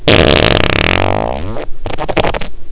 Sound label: Farts